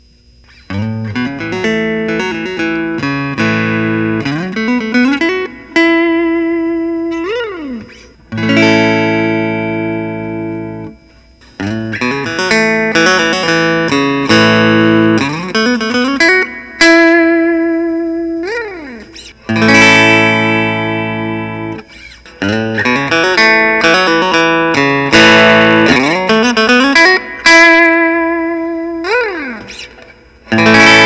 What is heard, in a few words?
fender telecaster maple cap blonde